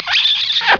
kiss; mk2

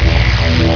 kill; mk2; Raiden

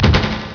big fall; mk2

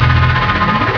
spin; KungLao

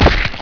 crunch; mk2

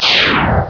fly; Reptile

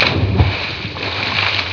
splash; mk2

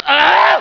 throw

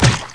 big stab; mk2

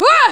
throw; mk2

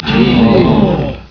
roar; mk2; Kintaro

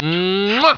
kiss; JohnCage

